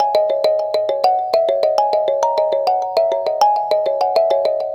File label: Mbira Hi; Melodic; Ambient-Melodics-II